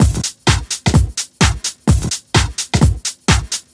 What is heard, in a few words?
House Beat, 128 BPM